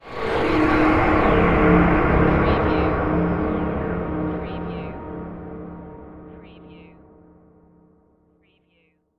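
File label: Production Element Sweeper; Sound Effects Bible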